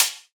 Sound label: Acoustic Drums - Closed Hat; Antidote Advent